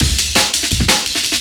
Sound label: trash amen